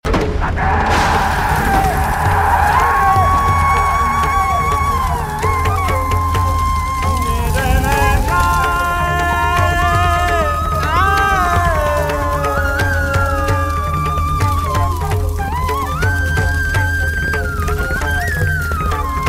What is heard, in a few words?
Screams; Mahadev